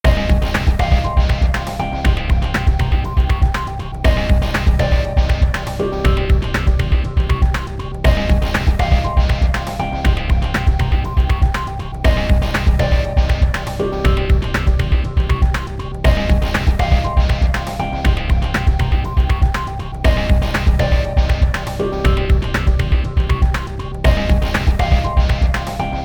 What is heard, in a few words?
dungeon, bgm